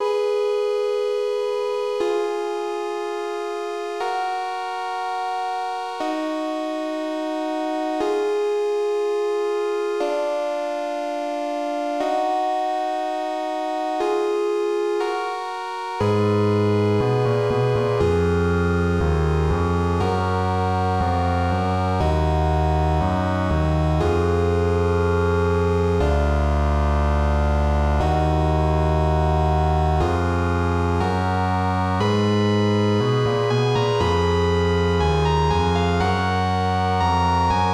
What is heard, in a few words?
Error; Toodee and Topdee Original Soundtrack